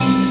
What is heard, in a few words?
Jazzy Hit